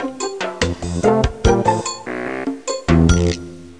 Time Game Intro Song Long; Let's Discover Michigan!

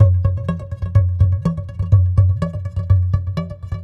PERCLOOP ETHNO 125; eJay

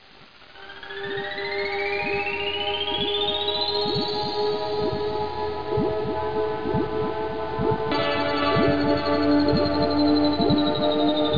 Space Song; Let's Discover Massachusetts

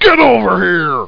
get over; Geschicklichkeit; Mean Cuisine

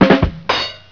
Snare Drum; SECRETS Software Sampler; Kaboom! Sounds Folder

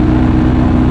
Low Lp, Engines; SuperBike2001

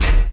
IF ARM EQUIP SLIDE; axisdemo

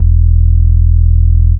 Subarctic Bass

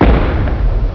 Let go of ball; Alley 19 v1.11

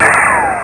laser; speedy

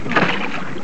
splish

